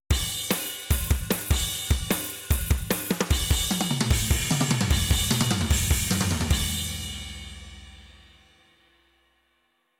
brick walled drums no ambiance, Heavy Brick-Wall Compression